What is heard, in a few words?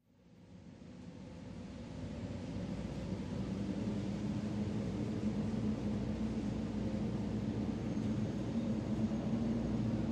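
Elevator Shaft Ambience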